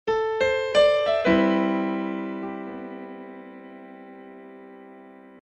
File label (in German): Klangbeispiel Piano 90BPM alter Player